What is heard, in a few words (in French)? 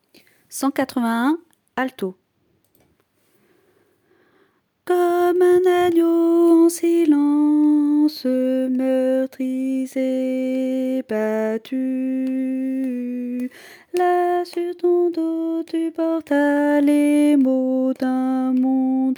alto